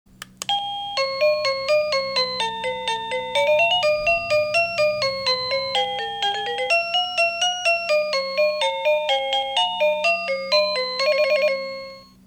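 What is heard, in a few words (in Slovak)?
Bezdrôtový zvonček melódie ukážka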